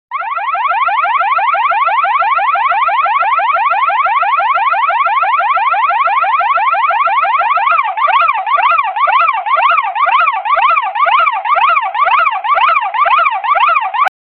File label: the sound of an emergency, siren